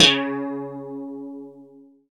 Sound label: GUI CLEAN, E-Guitar